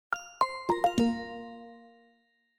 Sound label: Jumping off, switch on off; Alcatel Pixi 4 (6)